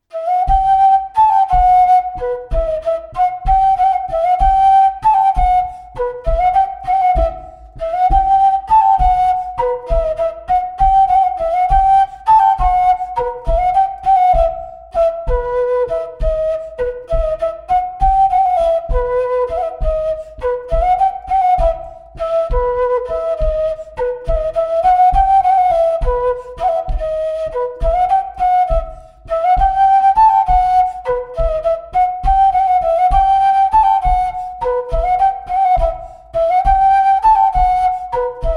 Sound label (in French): Montagnarde; Recueil De Raoulx